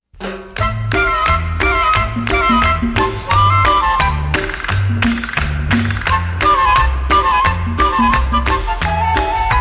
Las Castanuelas

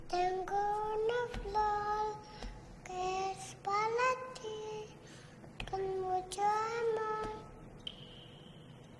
Cantando

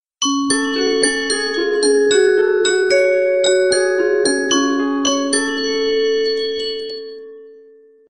Toques De Campana

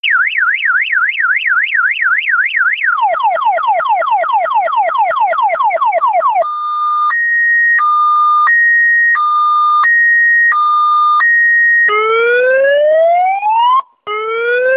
Alarma De Carro

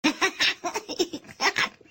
Risa

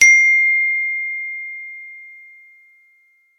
Notificacion

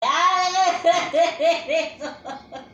risa

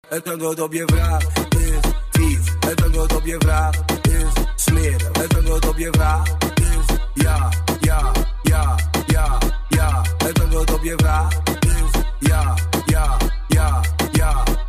jajaja

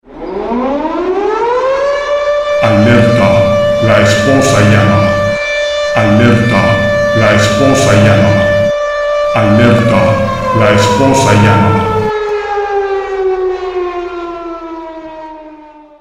Alerta Esposa

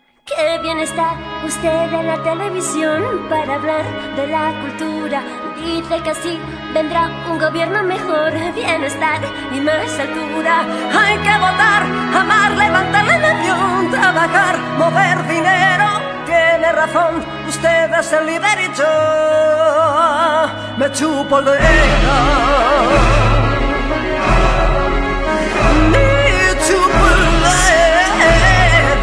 Coro

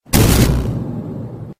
Arrancar Sonido

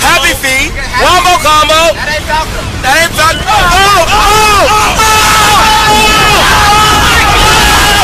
Gritos

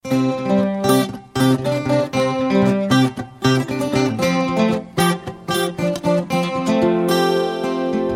Guitarra Acustica

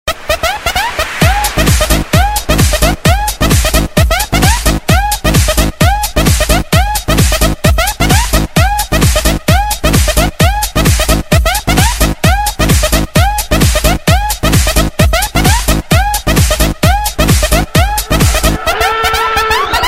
Alarma Remix

